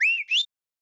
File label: whistle; Heaven Studio Plus; first Contact